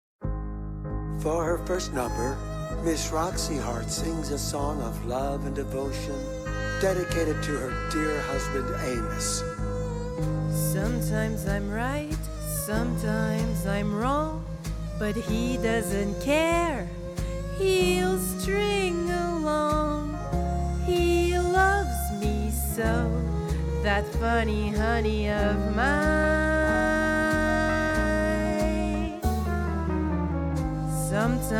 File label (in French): chant anglais canada accent quebecois chant adulte medium; chicago